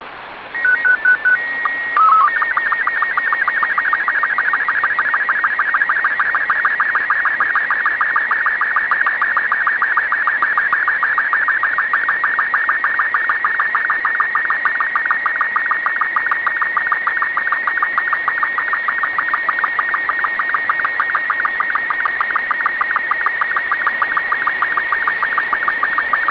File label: sstv